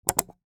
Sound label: sound of mouse click